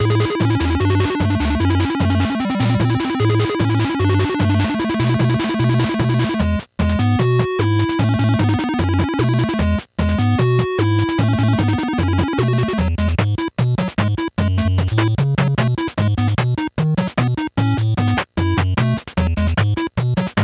Power-Up Music; super smash bros brawl

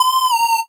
Beat

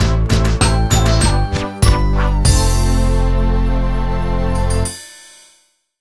Jingle LOSE BPM88; super scribblenauts; nds

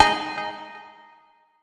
Chill City - G#; Popslinger

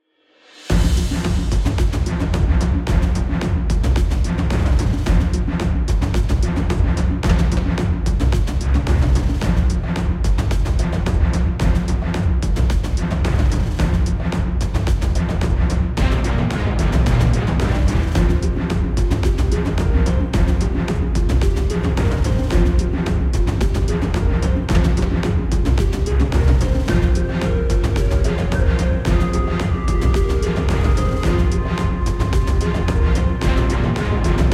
dungeon; sword of the necromancer

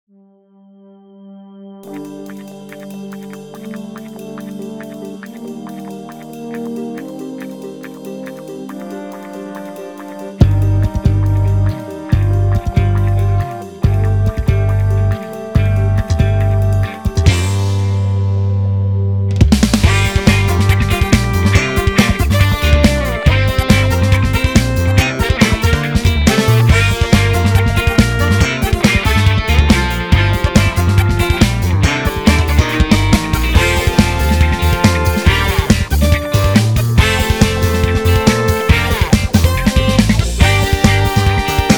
Funk Remix

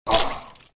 Sfx Enemy Lancer Dead

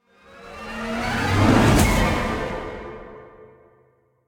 stinger hero appear; roguebook

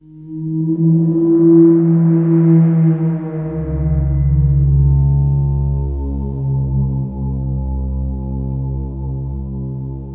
reveal; Star Wars Bounty Hunter

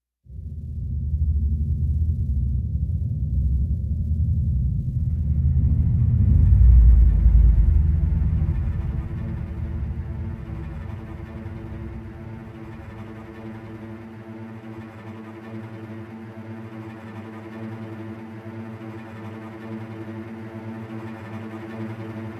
Ambient LIGHT TO MEDIUM TENSION

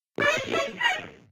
Cypig Oink

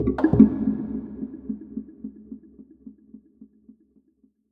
Tribal Omni

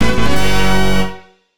fanfare short

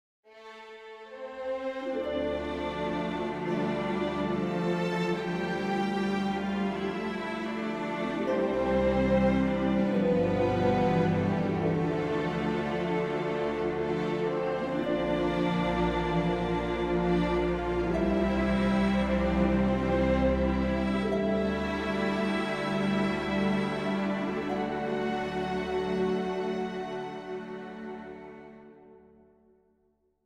Solo Violins; Mx Cue Birth Solo Violins

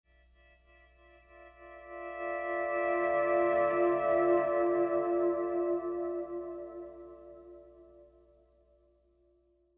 Pleasant Guitar Mood; the last of us

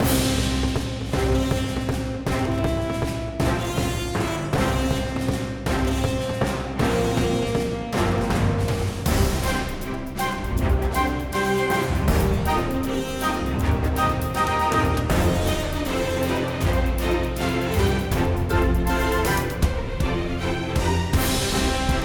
Music Castle; lego brawls gamerip 2022

